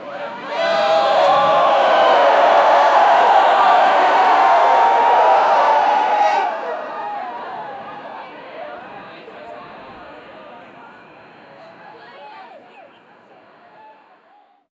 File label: sound effect of a crowd, concert